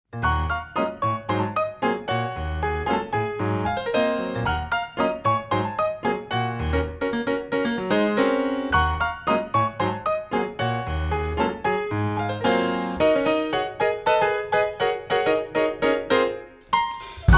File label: Quartet Froglet's dance